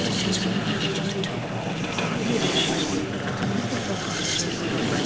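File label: haunted whisper; envrn; The Elder Scrolls III Morrowind GOTY; XBOX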